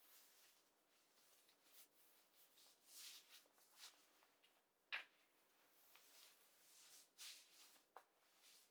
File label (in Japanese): 靴下を脱ぐ